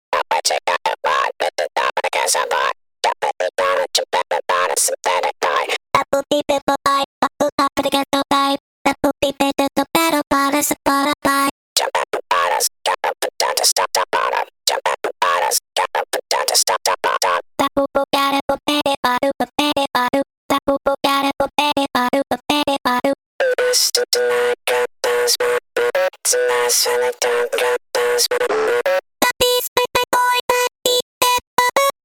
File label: voices